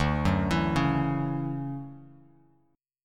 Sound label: D-Major 9th D down Bass Standard; DM9; arpeggiated; JGuitar